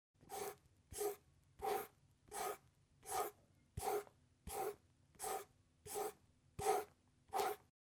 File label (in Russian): zvuk karandasha